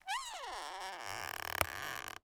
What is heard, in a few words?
CREAK Wood Tree Creak Bright; mono; Universal Sound FX